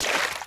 puddle

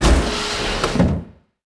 door sliding mechanical

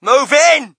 crowd, shout; Mike Tyson Heavyweight Boxing

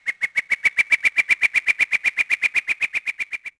bird; Tony Hawk's Project 8; XBOX

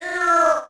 die, creatures; bith; Star Wars Obi-Wan; XBOX

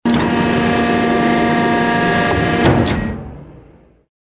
crane; liner; Soldier Of Fortune II Double Helix; XBOX

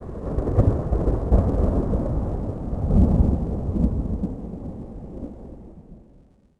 distant Thunder; Star Wars Obi Wan; XBOX

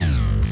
droid servo

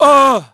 GRUNT; VOICE; PHANTOM; Pulse Racer

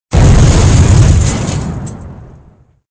explosion glass debris; weapons; Soldier Of Fortune II Double Helix; XBOX; explosions

